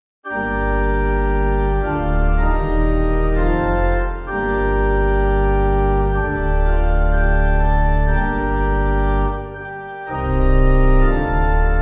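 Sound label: pipe organ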